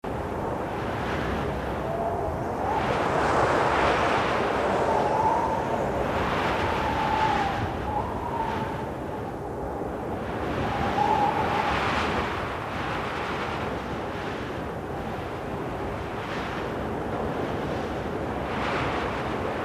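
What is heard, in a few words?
Wind Gust Summit Breeze Loop; the unfinished swan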